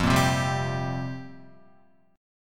F Suspended 2nd Suspended 4th F down Guitar; strummed; JGuitar

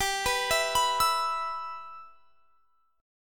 G 6th; G6 arpeggiated; x,22,21,21,24,24

